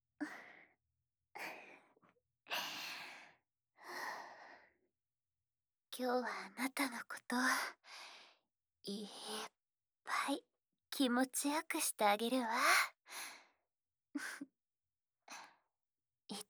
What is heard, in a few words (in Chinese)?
舔耳前戲（右耳）